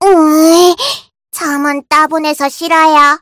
negative vocalization; spyro

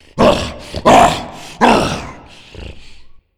tank spawn

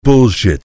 soldier negative vocalization

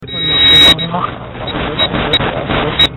funny friend idle; slender; zerosiki

